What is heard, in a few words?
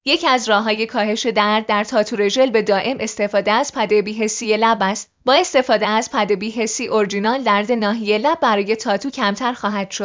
pad